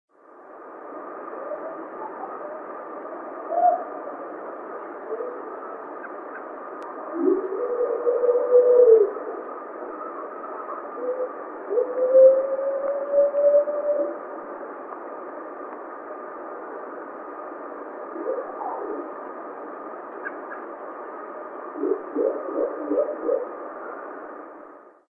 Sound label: Roar And Vocals; Michigan; Isabella County